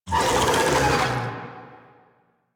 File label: Infested Big Ashen Vocal Attack; Warframe